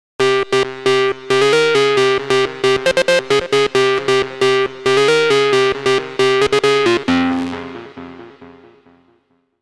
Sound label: Hard FM, Korg